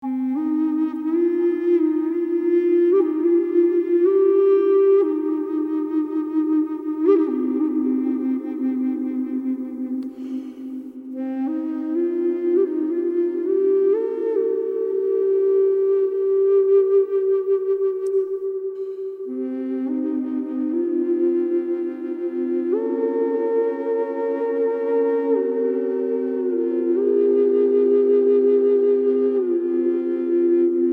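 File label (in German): C mit Reverb, drone flute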